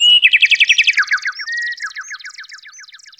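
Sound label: birds song; SFX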